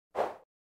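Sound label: operating system Mac OS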